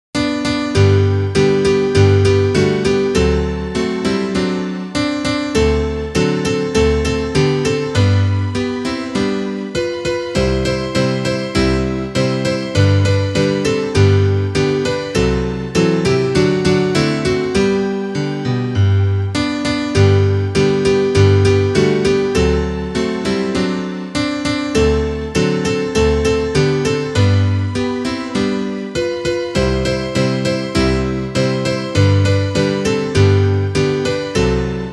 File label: instrumental